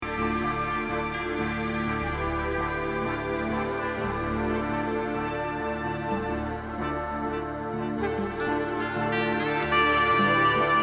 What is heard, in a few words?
Planetary Blues